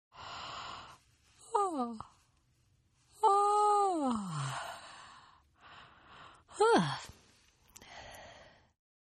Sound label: Yawn Female; Yawning; Partners In Rhyme